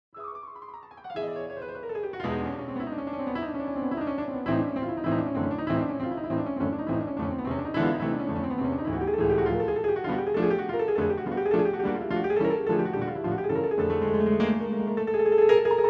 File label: Flight of the Bumble Bee, piano roll; Rimsky-Korsakov; Rachmaninoff